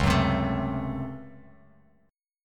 Db Major 7th Suspended 4th Sharp 5th Db Bass Standard; 9,9,10,14; strummed; chord shape